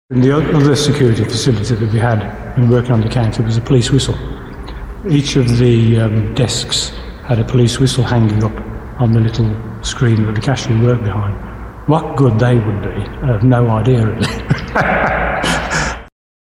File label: police whistles; david parkes